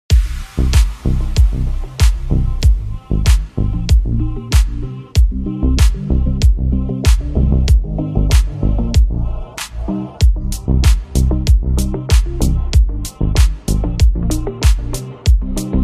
chill mix

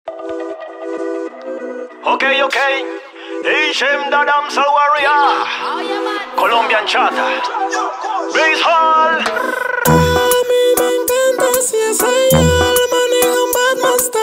basshall session

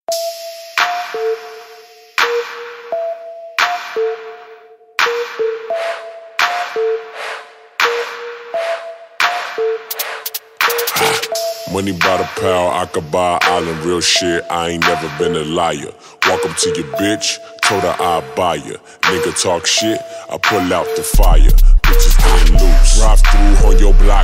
31-35hz low bass screwed; Rebassed

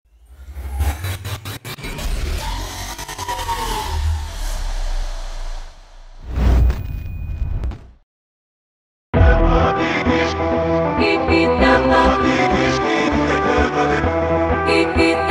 hard motivational rap beat rock guitar type

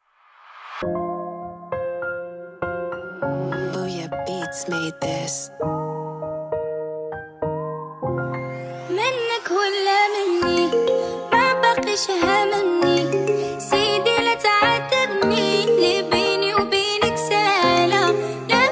oriental trap beat x balkan hip hop instrumental

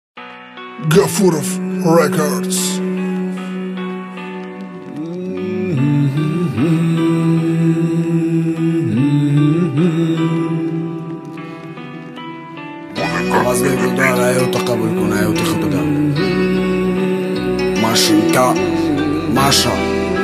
rap hip hop

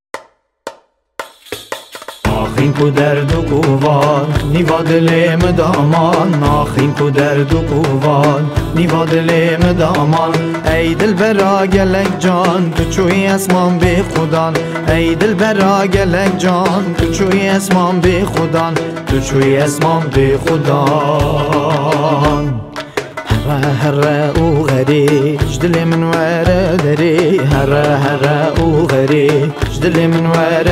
Kurdish 6 8 mashup

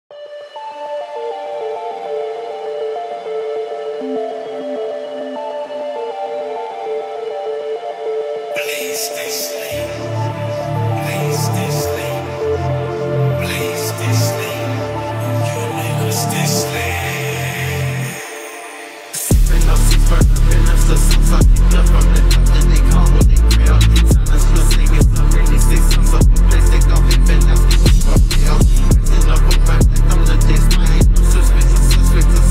wave phonk

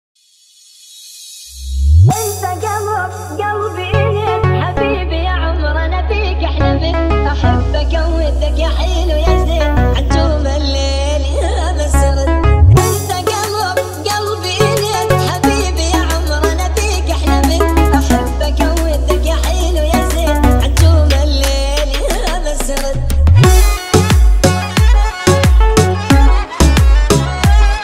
remix arabic music

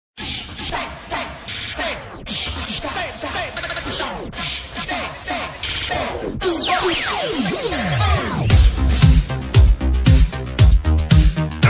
disco extended remix

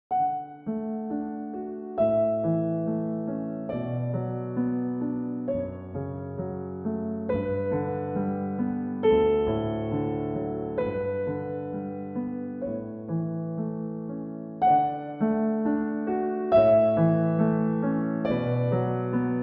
Canon in D; Канон ре мажор; Пахельбель; Pachelbel

